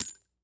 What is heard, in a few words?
Weapon Bullet Shell 3030 Shell Cases Multiple Cases Fall On Dirt; AdobeCC